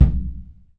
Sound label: HAND MUTE, Surdo; E-MU Producer Series Vol. 2; More Studio Essentials; Composer